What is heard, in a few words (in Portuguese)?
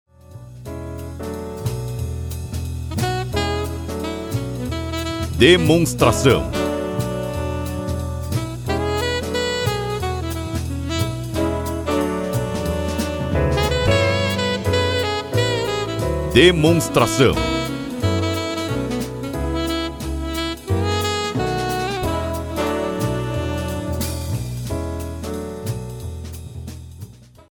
Trilha